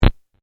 Telemetry Single, Scifi, Telemetry, Single, Blip, Science, Fiction; Communication; BlastWave